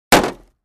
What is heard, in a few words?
Plastic Car Door Hit